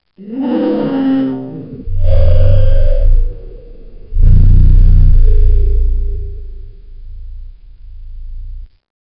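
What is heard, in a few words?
its a distorted low booming, mammal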